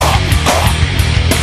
Clearing throat

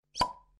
Bottle Open

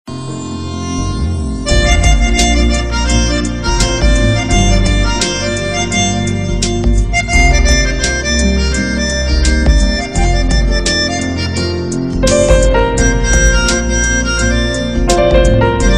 Instrumental Hosanna

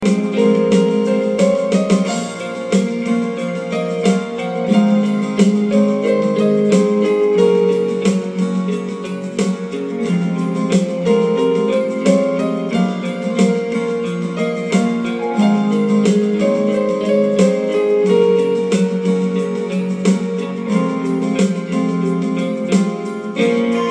Instrument

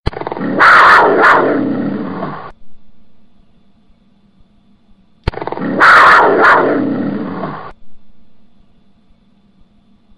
New Lion Roar

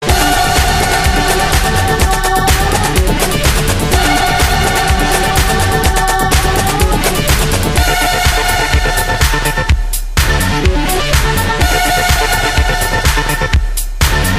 Hacker instrumental

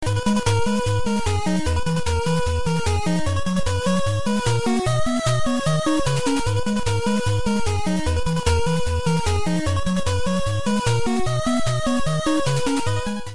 Fight Music

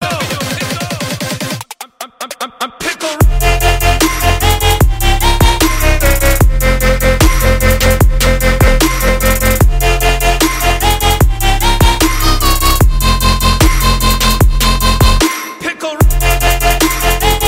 trap; Pickle Rick

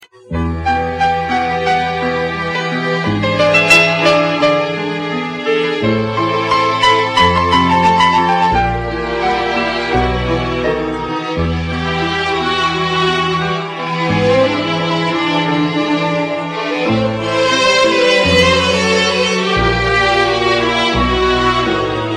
Oriental Dream

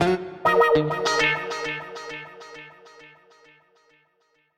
Twang

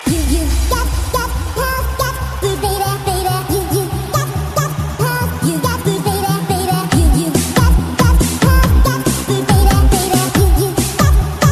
House

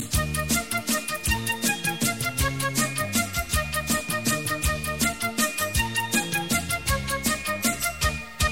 Bollywood Best Tune

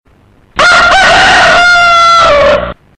Rooster Alarm

